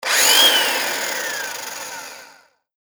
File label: buffer on off